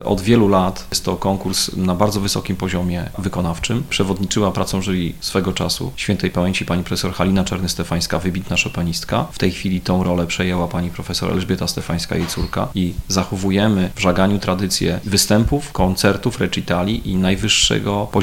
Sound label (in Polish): pianisci